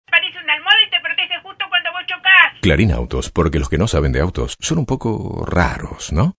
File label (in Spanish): clarin autos